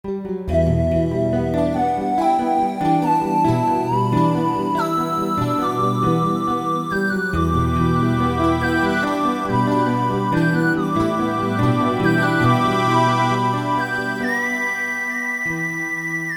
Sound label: Guitar Arp; samplefarmpro